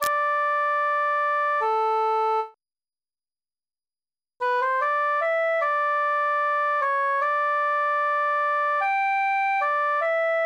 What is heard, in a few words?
cantus solo